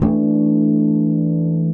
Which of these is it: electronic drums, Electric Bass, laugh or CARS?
Electric Bass